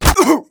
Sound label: pain, player; Team Fortress 2